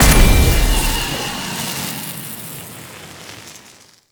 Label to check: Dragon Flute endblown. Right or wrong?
Wrong